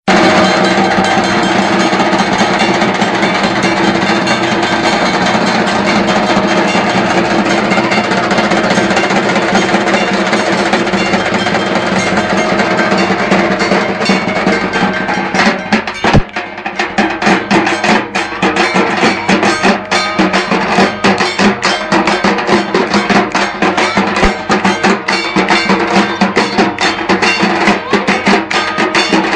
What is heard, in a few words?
Durga Puja Dhak Dhol; mahalaya 2024